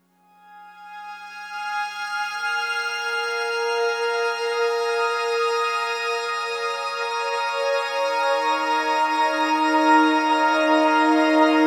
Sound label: Long Hi Complex, Complex Wave